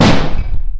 Bomb

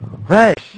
ooff, Worms speechbanks

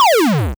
explosion

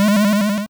powerup

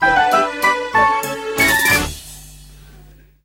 Theme, Fanfare; Miodowe lata

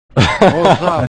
laugh, Worms speechbanks

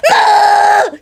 ooff, Worms speechbanks; Houshou Marine

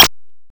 shoot

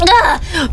ooff; Mori Calliope

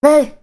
ooff; Gawr Gura

